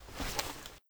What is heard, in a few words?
wa2000 draw, weapons